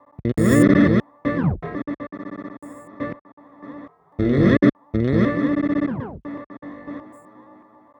Processed Electric Bass

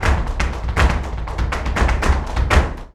BULERIAS TAP, Flamenco

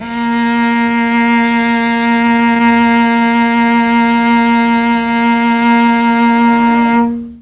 A#2 300 CELL, Mellotron, STRINGS; Mike Pinder - Mellotron Archive CD

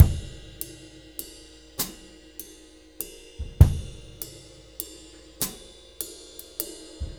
6/8 Loop, Drum Tools, Laid Back; Sonic Foundry (Sony Creative Software); Loops & Samples Drum Tools

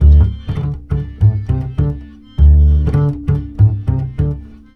MEH2 BASS

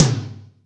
AC Tom L, Acoustic Tom; Yamaha DTXpress

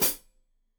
Hat Foot, Hi-Hat, Cymbals Stereo

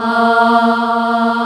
Choir; AKAI S-Series CD-ROM Sound Library VOL-2; CHO HARP

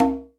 Open Hi Conga; Zoom RT-123

